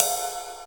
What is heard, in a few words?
cymbal sound f key, Loudest frequency: 6473Hz